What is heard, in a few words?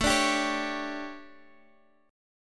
D Major 7th Suspended 4th, chord